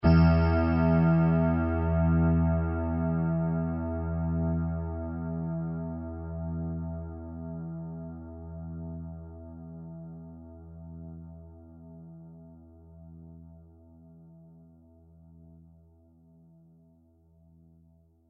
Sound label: sylfid sustain